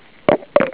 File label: glug log